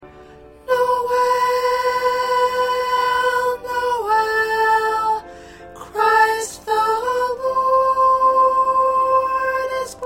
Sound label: 2nd Soprano, choir parts